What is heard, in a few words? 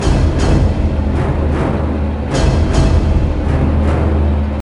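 Ambient Music Loop; Ghostbusters The Video Game; NDS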